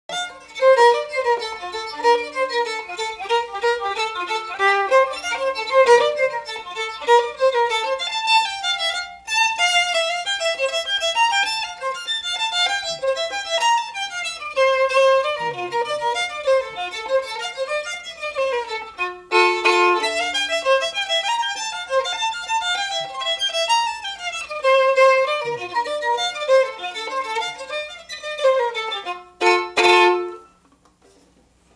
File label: Hornpipe; Fischers Hornpipe